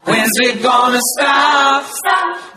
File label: is it going to stop stop sung; questions